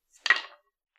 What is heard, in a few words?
wood material